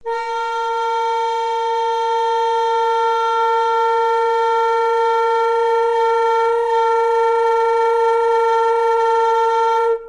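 A sharp 4 with A sharp 5 with F6, multiphonics, flute; modern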